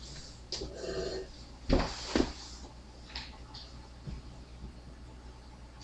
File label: fireplace